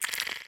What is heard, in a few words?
slobber, clams; infestation